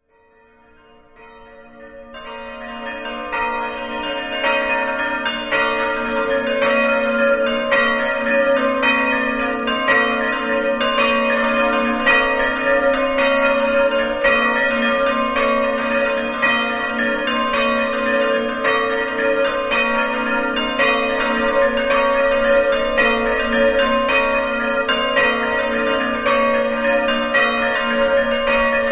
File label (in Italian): villuzza, campane